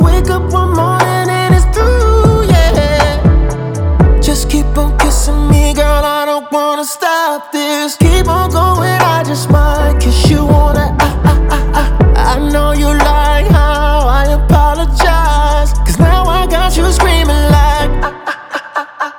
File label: Aaaaahhhhh